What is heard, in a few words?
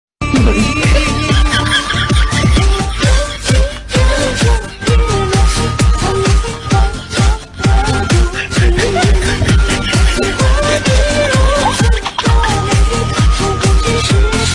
laugh; breadradio